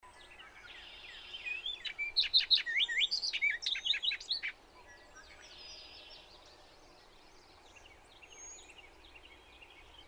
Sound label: Orchard Oriole Icterus spurius; birds-that-eat-grape-jelly